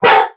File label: wolf hurt